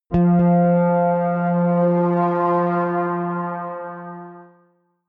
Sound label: synth bounce high, drones